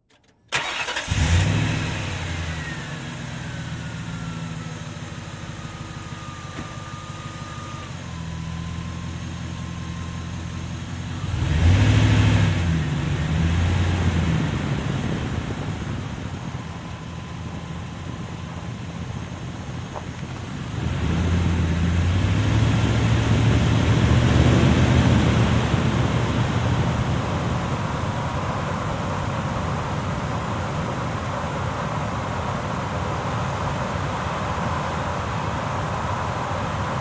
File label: Expedition Start, ford expedition